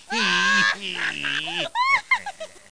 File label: Ah Laughs; Bible Fun Pack; Education Publishing Concepts; NOAH